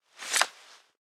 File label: Wpn knife unequip